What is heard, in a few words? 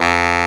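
BARRI F 2, SAX; AKAI S-Series CD-ROM Sound Library VOL-8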